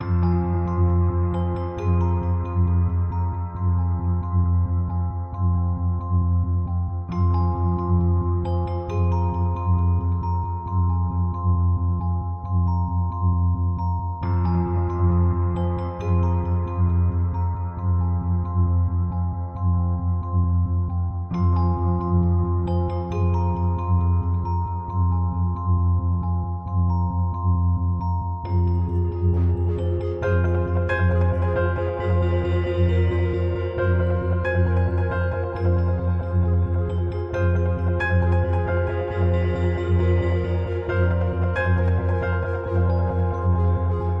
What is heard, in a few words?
No Percussion